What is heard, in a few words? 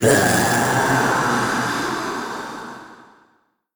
trapdoor; Crypt of the NecroDancer